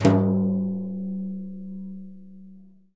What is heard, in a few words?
Synclavier Percussion, World & Orchestral